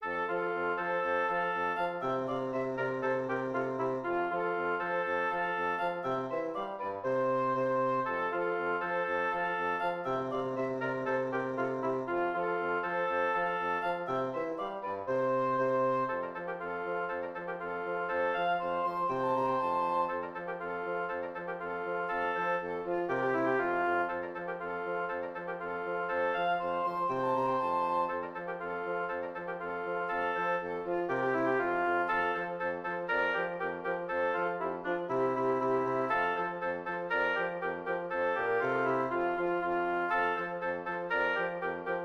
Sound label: contradanse trio